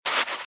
Footsteps Sand Dry Run Right; RadwinIsland

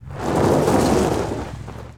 Auto Tires Peelout Gravel; Automobiles

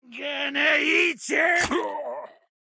goon approuch; Blind Drive

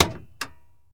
Truck Dakota Hood Latch; Trucks

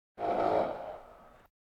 Animal effects snorts grunts farm ambience

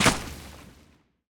Earth Enemy Footstep; AudioWizards

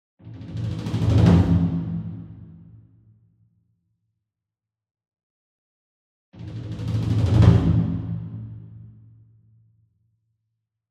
SINGLE DRUM Taiko 28 Inch Roll Hard Beater Far; boom library; Cinematic Strikes Construction Kit

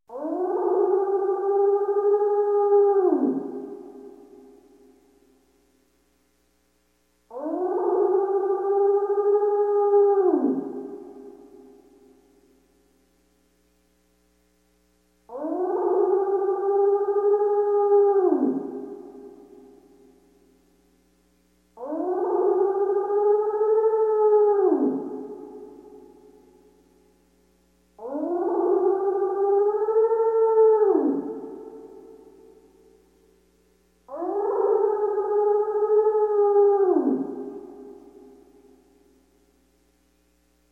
Hounds of Hell, Scary Sounds; 1001 Sound Effects; Halloween Horror Sounds Effects

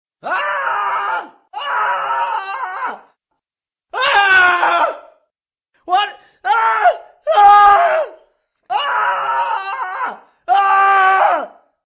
scream; RadwinIsland

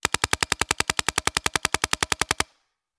Paintball Gun; Sound Ideas; Guns

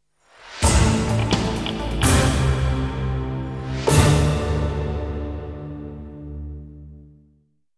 jingles; 90 DDD Jingles

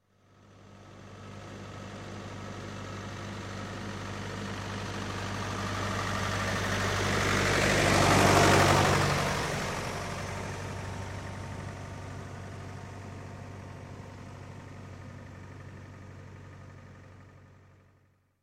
Tractor, Diesel Pass By, Fast, Industry, Farm; Sound Ideas 2000 Series

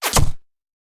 Bullet Impact; Sound Ideas; Guns

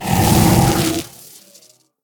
Lightning Enemy Growl; AudioWizards

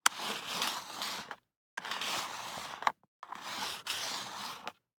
DICE on Cardboard, Slide, Standard, 2 Two Dice; Samples; ArticulatedSounds; DICE Sfx Party (Extended)

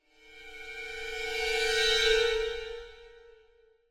Symphonic Hit Passing Violin Duet Higher; Hollywood Edge; sound designer's toolkit v2

